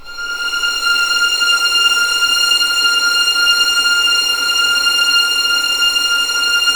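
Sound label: F6 violins; East West; The Ultimate String Collection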